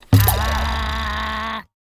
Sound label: Caramel Miss Sound; Jolly Battle; Android